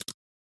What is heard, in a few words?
Lock